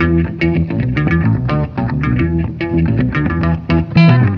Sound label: guitar boogie down; Loops; Phonky